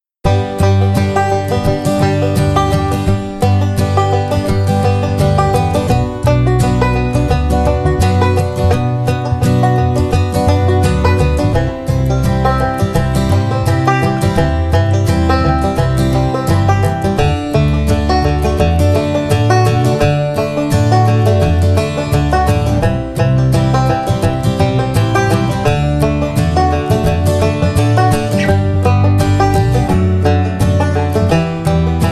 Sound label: banjo love; banjo romp